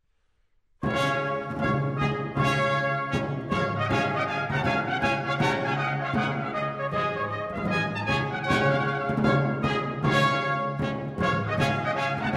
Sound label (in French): Marche de triomphe avec des trompettes et des timbales